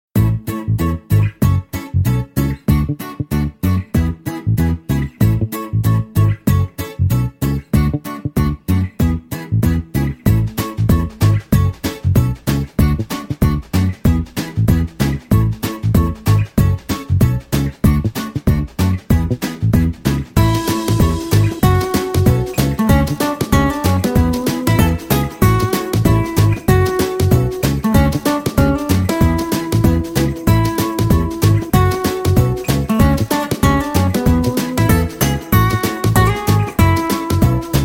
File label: Upbeat Happy Tune